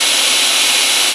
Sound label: plane; Player SE